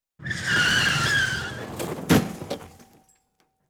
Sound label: Car, Braking Skid And Minor Accid; Emergency; BBC Sound Effects Library